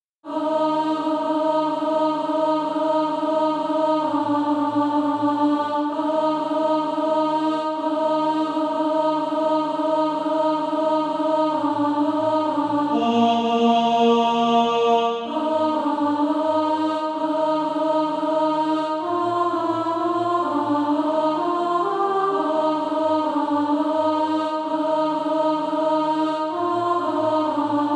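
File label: alto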